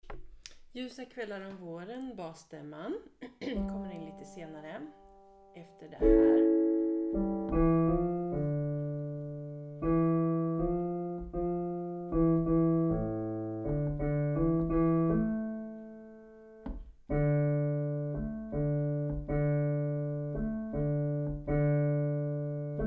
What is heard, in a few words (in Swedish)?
Ljusa kvällar om våren bas